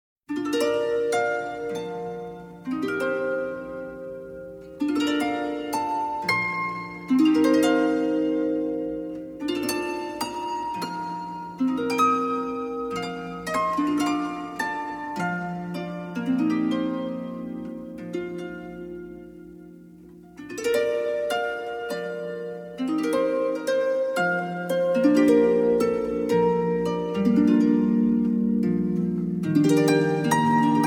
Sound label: harp